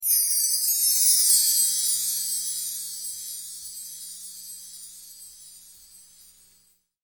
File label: BELL TREE